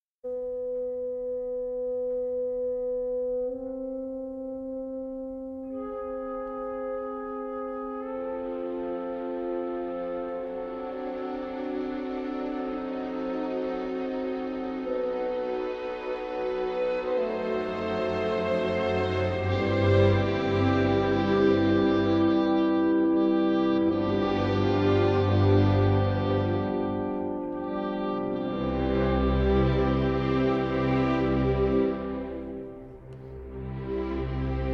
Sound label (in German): 2 Satz aus dem Violinkonzert E-Moll; Felix Mendelssohn